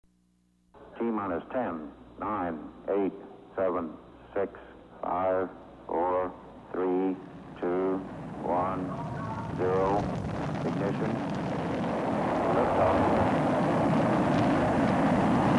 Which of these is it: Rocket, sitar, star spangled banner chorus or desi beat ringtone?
Rocket